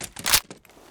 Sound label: pp1901 mag in; garrysmod